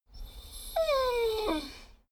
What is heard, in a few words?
dogo argentino whine squeak; natur-tiere; hunde